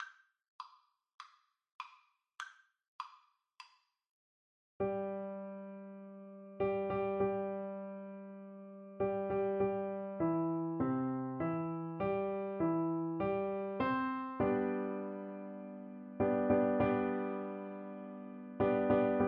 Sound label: rossini william tell, flute